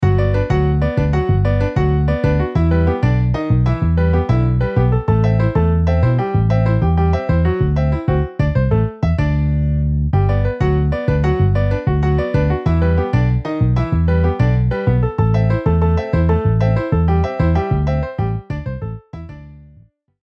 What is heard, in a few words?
Piano Bass